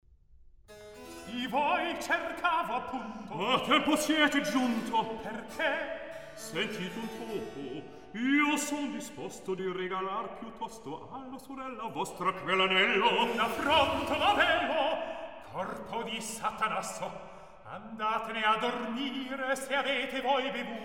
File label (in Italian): Recitativo; Di voi cercavo appunto; Mozart; La Finta Semplice